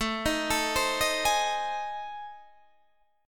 A Minor Major 7th Flat 5th, Guitar Standard; arpeggiated; AmM7b5; JGuitar

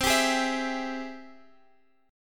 G sharp Augmented Major 7th C

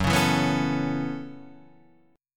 F sharp Minor 11th, Guitar, Half Step Down

